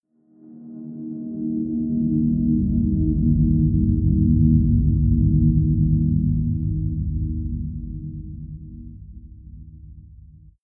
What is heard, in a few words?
deep atmospheric drone; musikelemente; drones